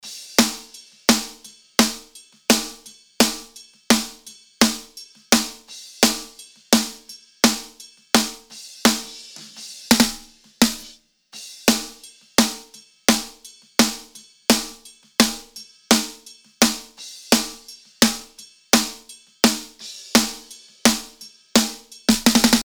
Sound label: snare